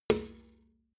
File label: metal bucket hit bottom soft